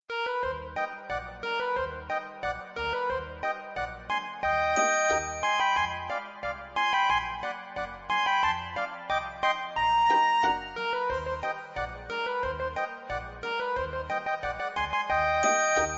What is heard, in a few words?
polka